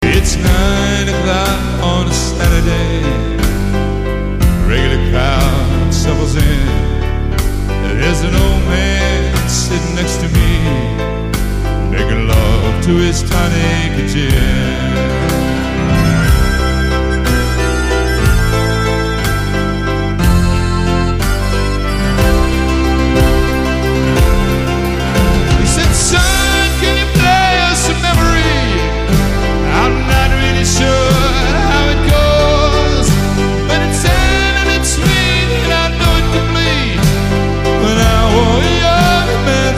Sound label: Piano